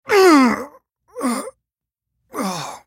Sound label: dying; Yasuo; en US